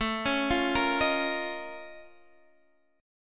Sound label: A sharp Minor Major 11th, A